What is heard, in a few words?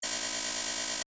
Computer Data Streaming Effect; Computer Sound Effects; Computers (Sound Effects:Science Fiction); Stuart Duffield